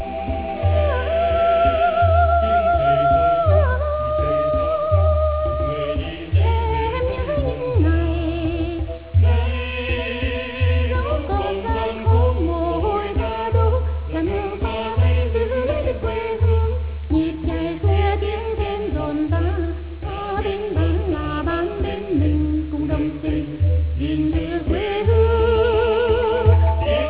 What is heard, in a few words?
bombo